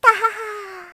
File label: "tahaha"; kanjou